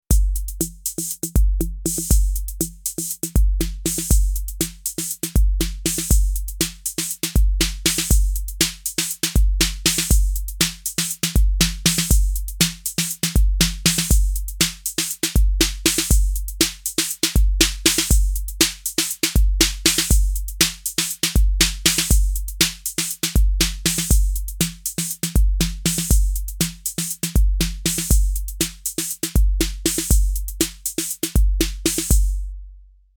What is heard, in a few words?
Roland TR 08 Snare